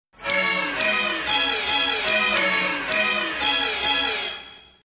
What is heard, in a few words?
Disco, RingTone; LG GD570AW dLite